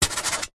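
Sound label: office pen scribble signature on metal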